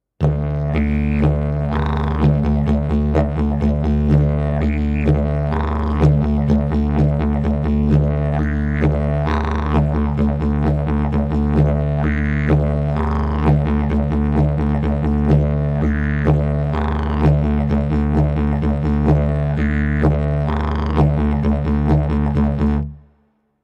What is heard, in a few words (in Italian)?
ritmo livello intermedio